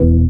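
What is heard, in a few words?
synthesizer Orrrrg E; Best Service Gigapack 1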